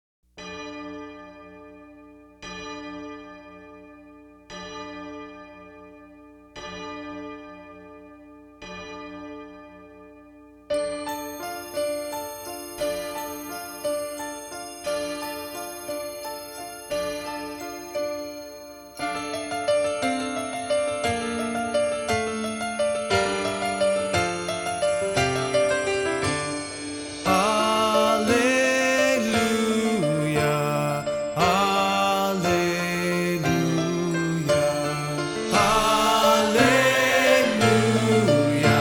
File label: Carol Of The Bells Gospel Acclamation